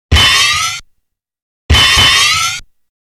Metallic Punch, Halloween; Halloween Sound Effects

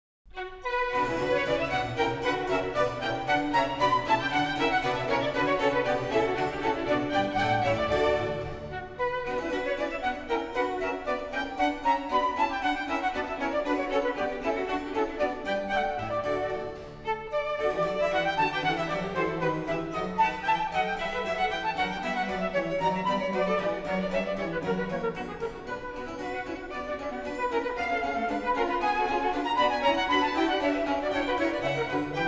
Orchestral Suite in B minor, Bourrée; J.S. Bach; Orchestral Suite No.2; BWV 1067; Bourrée I & II

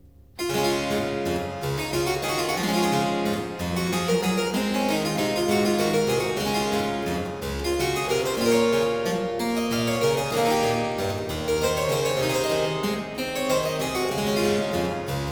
Suite in E flat major, Courante, Keyboard Works; J.S. Bach; BWV 819; Suites, Fantasias, Preludes & Fugues

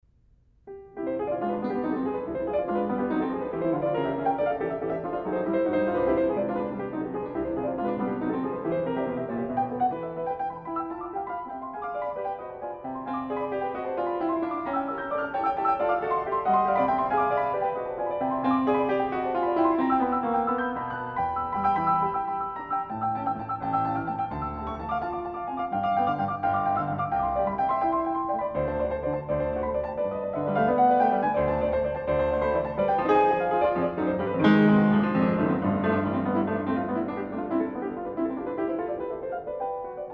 Etude for piano in C major; Chopin; Etude for piano No. 7 in C major ('Toccata') Op. 10-7, B. 68

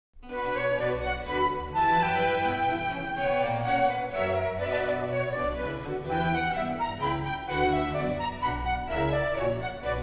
Orchestral Suite in B minor, Menuet; J.S. Bach; Orchestral Suite No.2; BWV 1067

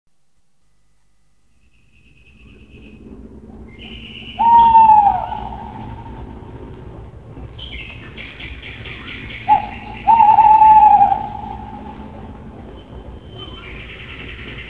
Owl Hooting; Halloween Sound Effects

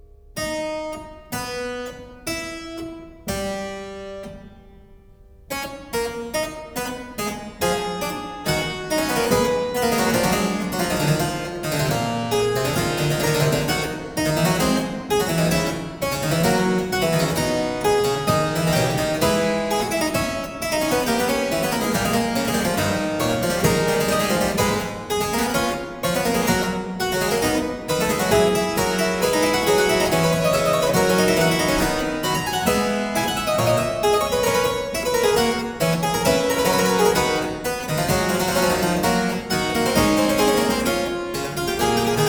Prelude & Fuge in A Minor, Keyboard; No.20; BWV 889; Johann Sebastian Bach; The Well-Tempered Clavier; II Fuga

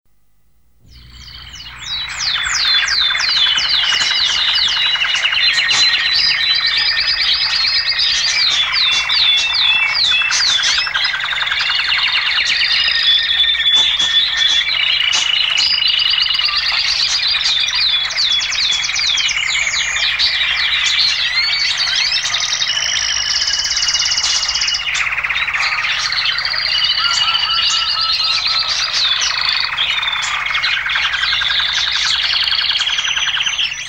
The Forest, Halloween Sound Effects

